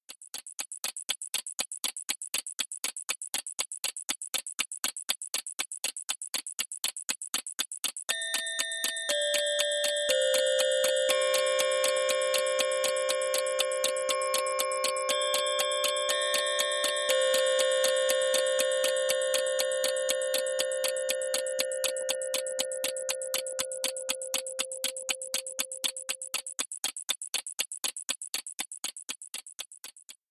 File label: clock